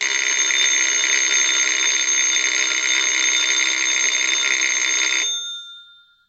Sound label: alarm clock